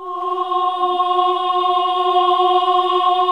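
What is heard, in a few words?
OHH F#2, choir; Best Service Gigapack 1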